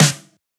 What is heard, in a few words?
snare